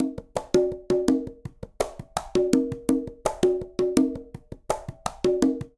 Danzonete Quinto Conga 166bpm, Latin Percussion; Ableton; Factory Packs

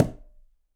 Conga C Mute; Latin Percussion; Drums; Drum Hits; Ableton; Factory Packs

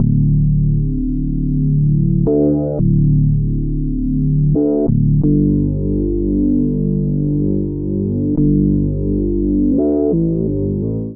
Grundgy Bass D Major 86bpm; Bassline; Tonal; MIDI Clips; Drive and Glow; Ableton; Factory Packs

